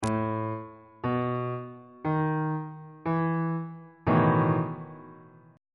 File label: f flat major 7th suspended 4th 1st inversion